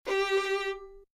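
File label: violin sol; violon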